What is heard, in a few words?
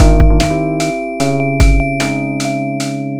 Noteblock Song